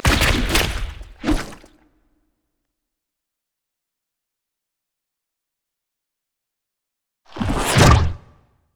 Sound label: emote recall windup; LoL; zac base